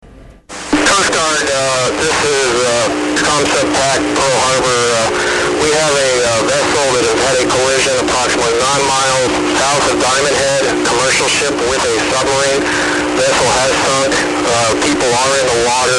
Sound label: comsubpac distress; ssn